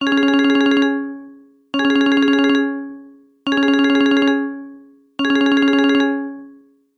multimedia voip call ring software; zapsplat

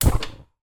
oven stove gas ignite; household

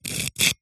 foley rock brick metal blunt scrape single

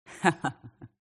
HUMAN VOCAL Female Laugh Arrogant; smartsound

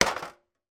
impact metal rattle tin

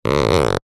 plastic rub squeak with hand; foley

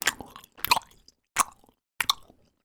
human eat chew sweets wine gums; sound effects one

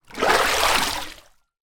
water movement plastic chair lift out; zapsplat

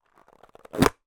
cartoon pull stretch release; zapsplat

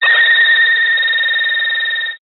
science fiction radio signal interference burst

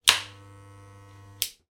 hair clipper switch on run short off

